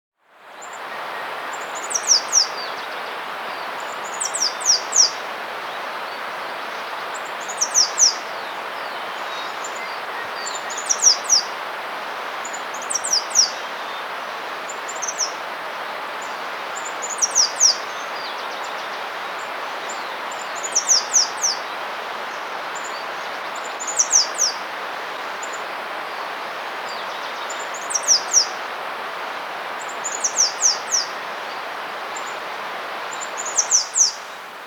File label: Capped Conebill (Conirostrum Albifrons), Andean Birds; S. America